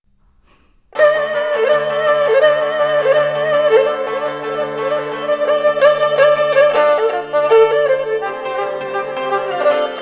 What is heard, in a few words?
erhu